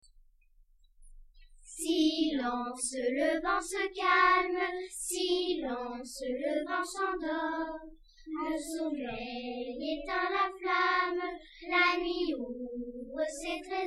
vent

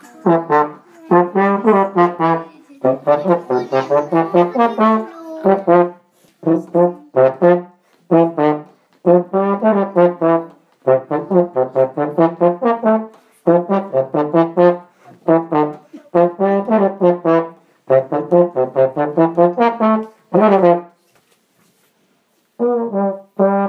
morceaux d euphonium

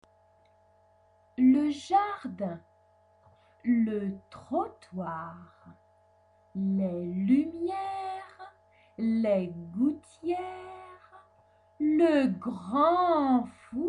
le tambour

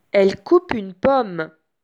vocal

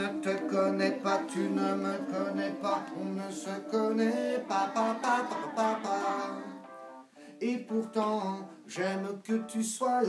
chant

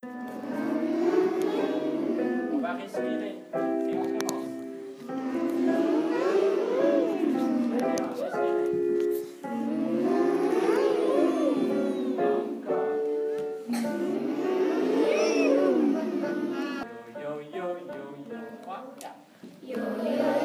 vocalise

